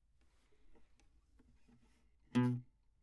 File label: Classic Guitar